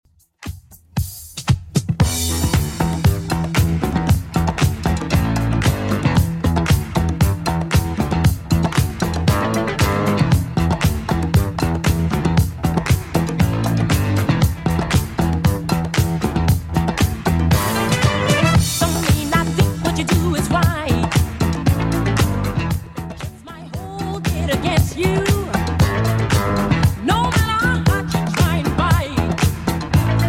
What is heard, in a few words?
80s Redrum 116bpm CLEAN